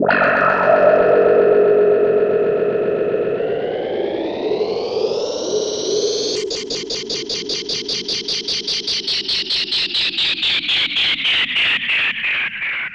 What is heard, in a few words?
Rhodes FX; EZRollers